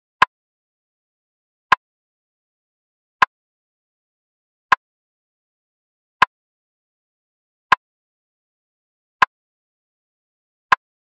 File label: Drum Loop - 80 BPM Rim, Lofi; Loop Stems; Cymatics; GHOST (Beta Pack); Autumn Drum Loop